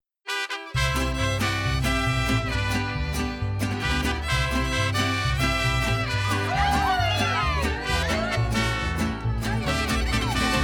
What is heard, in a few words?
jingle; Tecate